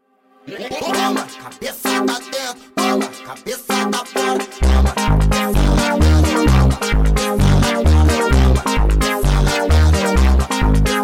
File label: Phonk